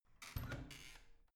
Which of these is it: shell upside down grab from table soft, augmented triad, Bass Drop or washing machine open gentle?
washing machine open gentle